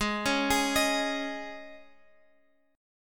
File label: Ab Suspended 4th chord, Guitar, Open F tuning; Absus4; arpeggiated; chordshape; 20,20,20,22,x,x